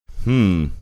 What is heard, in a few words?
hmm